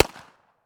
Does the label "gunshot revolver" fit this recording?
Yes